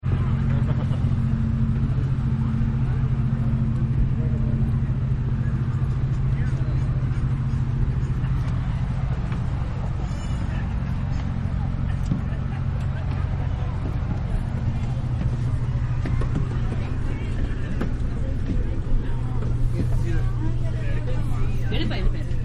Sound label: Bus Idle Interior Perspective, Passangers Getting On The Bus, Various People Having; Bus Idle Interior Perspective, Passangers Getting On The Bus, Various People Having Conversations In Chinese And Spanish; Human; People Sound Effects